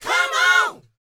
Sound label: LET, Vocal, R&B Dance, DANCE 120